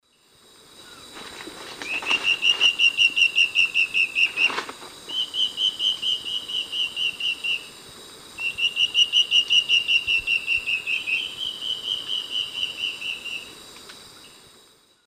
Myrmoborus myotherinus, duet; S. America; Eastern Lowlands

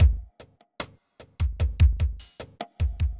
evil beats 75

